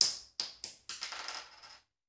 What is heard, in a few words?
Bullet Shell; Sound Ideas; Guns